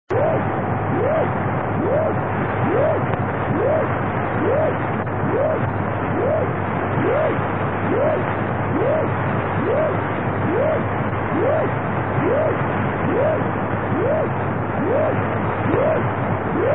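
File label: continuous wave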